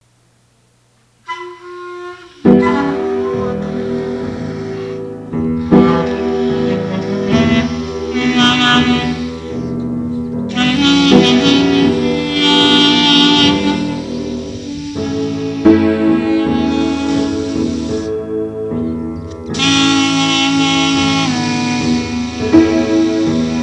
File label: clarinet